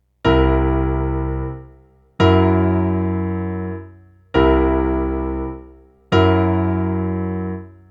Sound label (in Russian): Triton C7 и F7